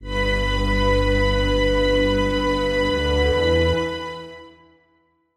Strings

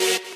saw

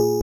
BELL

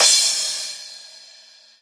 crash screaming

